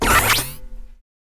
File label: teleporter; CosmicRage